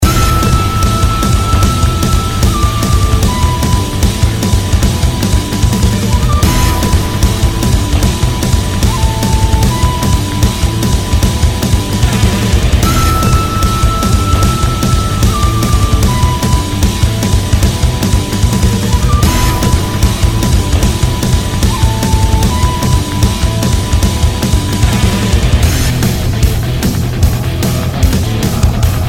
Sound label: Raid Boss; Mist Train Girls